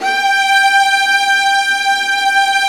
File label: Vas2 Bright; Roland L-CD702; Vlas Sect1-2